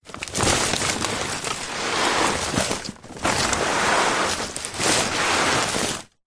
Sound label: household large green waste garden bag full plants foliage slide ground; zapsplat